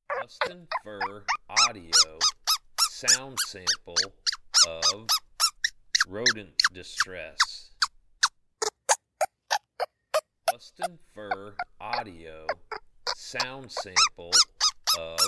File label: Rodent Distress